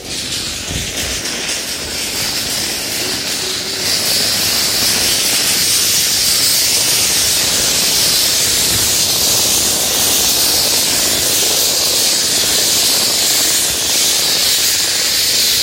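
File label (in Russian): тянут стальную ванну для строительства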